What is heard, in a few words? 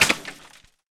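Weapon break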